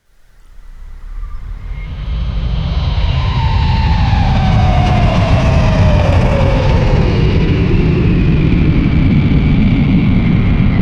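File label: rocket engine space shuttle, aerospace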